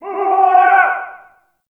ambient campsite yells; Warframe; Tau

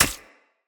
Fey Flock Attack Hit; Powers

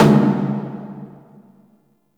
Taiko Drum; Warframe; Dojo Props; Taiko Drums

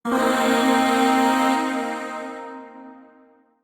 Shawzin Blues Chord; Warframe; Zariman Void Shawzin

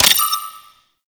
Tower Lock Console; Warframe; Bard Quest; Orokin Tower

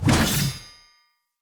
Sphere Dual Sword Throw Perfect, Weapons, Swords; Player

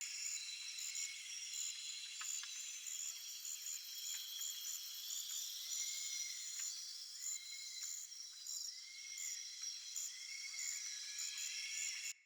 Critter Loop, Ambience, Glades; Glades Of Andurin; Critter Loops

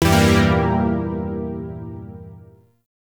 Shawzin Phrygian Chord C; Warframe; Zariman Shawzin; Chords

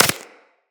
Laser Impact Generic; Weapons; Bullet Impacts; Surface

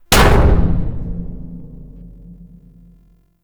Jump Slam Impact; Vehicles; Abilities; Warframe; Nechro Mech

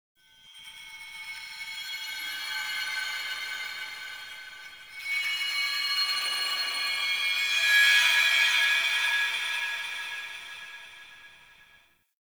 Glass Particles; Warframe; Ambience; Nokko Colony

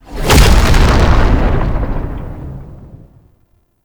UI Portal; Warframe; Duviri; Undercroft

